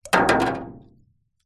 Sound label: foley stone small drop into metal tank of water; zapsplat